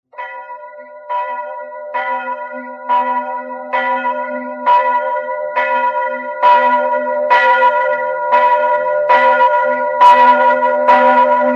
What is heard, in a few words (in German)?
Glocke Kreuzkirche